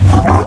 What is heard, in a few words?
pain, procoptodon; zoochosis pack